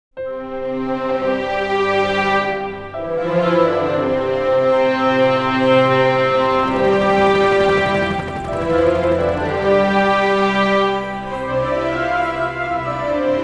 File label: Orchestral No Drums; DCAA Orchestral No Drums